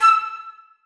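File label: flutes staccato d#3; Sonatina Symphonic Orchestra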